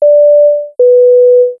Cabin Chime, air; soundfx; Transport-AirMarine

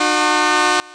Alco Short, trains, horns

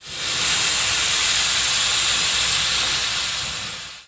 Steam Release Short, Trains; PFx Brick

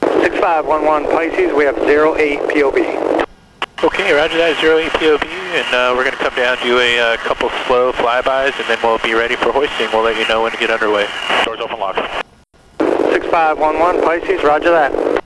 ATC Heli Fly By Ack; Transport-AirMarine; PFx Brick

